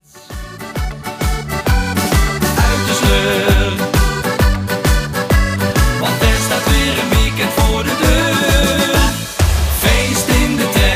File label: Orkestband; Goes tot Purmerend demo